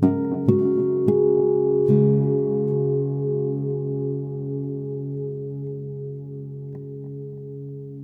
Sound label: Harmonics